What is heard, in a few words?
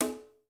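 brush conga, right; Spectrasonics; Liquid Grooves 1 & 2; CONGAS+BGOS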